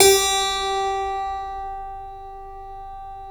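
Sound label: G3 clavinet left, Keyboards Acoustic; USB Soundscan vol.17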